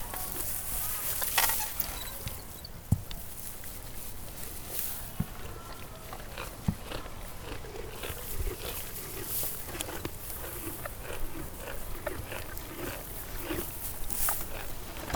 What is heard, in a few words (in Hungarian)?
legel, ló; állathangok; paraszt udvar